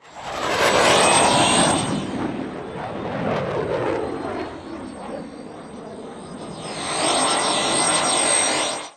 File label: rise up and hover; Titanfall 2